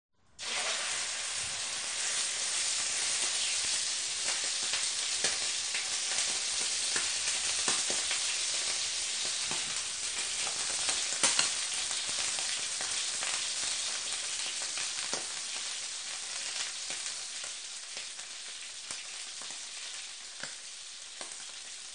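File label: shower sound when turning on the shower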